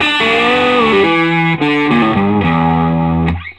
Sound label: SOLO E, Vintage Blues Guitar; AKAI; USB Soundscan vol.22